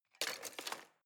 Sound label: knives wood drawer place soft